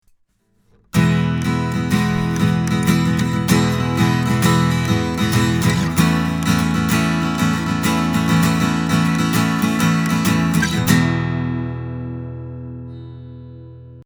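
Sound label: KM 184 Steel string High Z; Dave Hill Designs Europa 1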